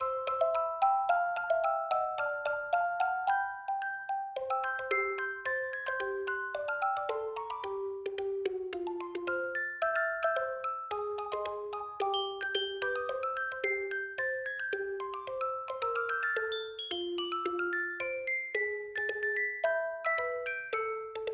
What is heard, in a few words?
Snow Night; SMM2; NSMBU